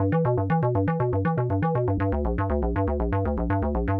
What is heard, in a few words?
SH101 Arp (120BPM), retro house